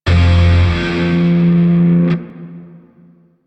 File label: Shawzin Blues Chord F; Grineer; DE; Warframe